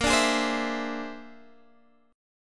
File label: A sharp 9th Flat 5th A sharp, Guitar, Open G